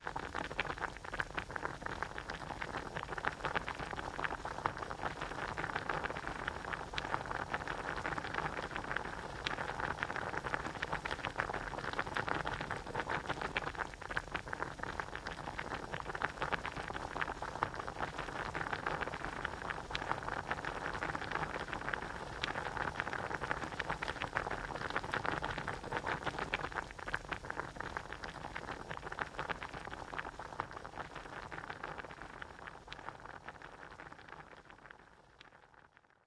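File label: Ambience China 3D Ambience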